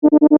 Multimedia Alarm, Technology, Multimedia, Alarm, Element; Alert Sound Effects; SoundEffects-BlastWave